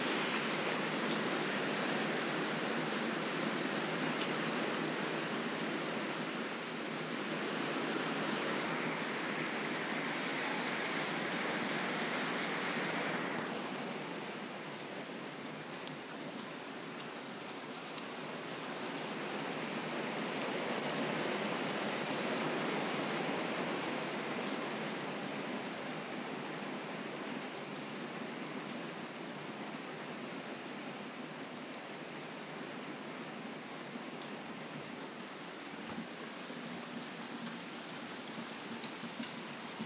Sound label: the sound of english summer rain